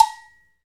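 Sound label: percussion small cowbell; Northstar - Drumscapes Roland; Bonus Perc; Cowbells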